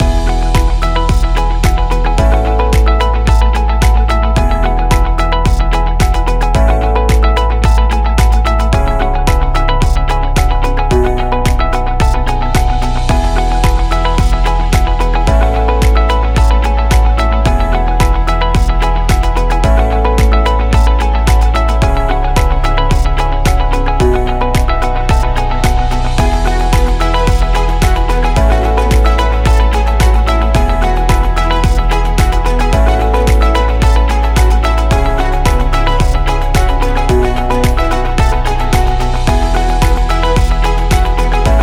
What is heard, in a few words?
Education Loop; technology